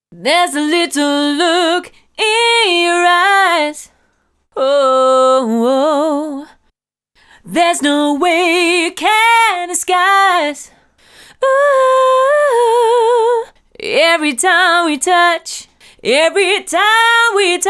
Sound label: Acapella